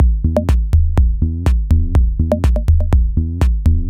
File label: E 123bpm, House Drumloops; Zero-G House Fabrik Drumloops